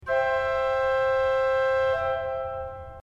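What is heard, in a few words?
principal 4 C, organ; srednja vas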